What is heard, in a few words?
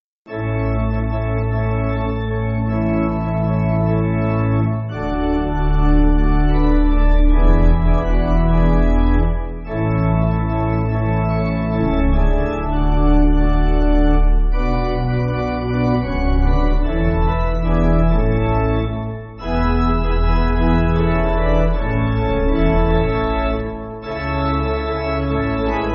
Pipe Organ, Music Backing; Bear The Good Tidings; Kirkpatrick